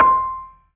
Percussion C4, B3; SoundFont - The Developer's Choice - Volume 1

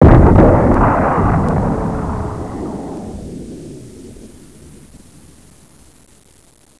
explosn

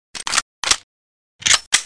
Mac10 reload; StrikeForce

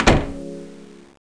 rabbit fairy door shut

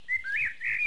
panda error

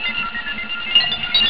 opera, acid

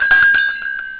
shop bell

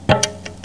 DROP

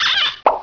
pop cart; Widget Workshop; A Mad Scientist's Laboratory (1996); Maxis

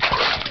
Walk on water; Realmz 4.2.2

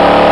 out car; Driver

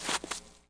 Snow Step, Footsteps; Urban Chaos

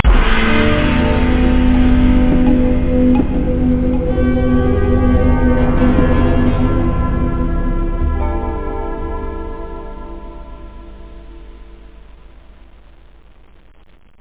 atmos

